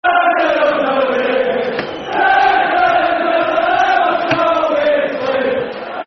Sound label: Rule Britannia, Football Chants; Rangers GRFC